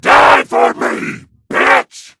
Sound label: stabbed; tf2; freak fortress 2